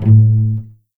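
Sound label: A1 pizzicato cello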